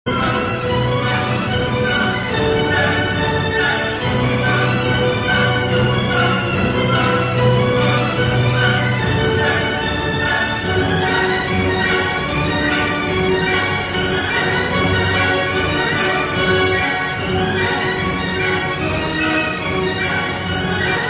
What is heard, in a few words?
classical ringtone